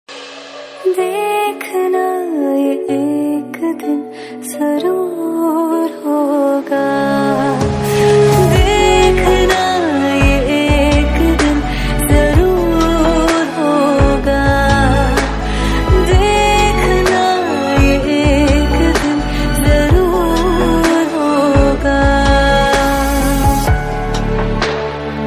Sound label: Romantic Ringtone; Notebook